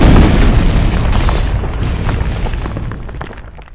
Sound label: wall destroy